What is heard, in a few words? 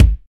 thick bass drum sound a key; kick drum samples